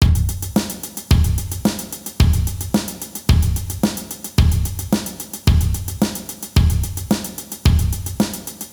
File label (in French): rythme